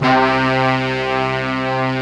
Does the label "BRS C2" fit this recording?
Yes